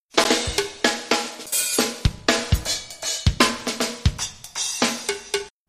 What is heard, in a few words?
drums